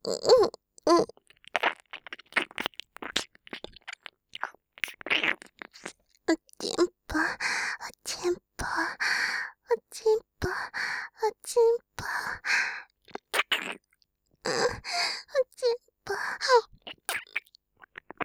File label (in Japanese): 耳舐め喘ぎ; お屋敷メイドのハーレム淫語ヘヴン 気が済むまで耳舐め淫語を堪能できるバイノーラルオナサポ音声; 由真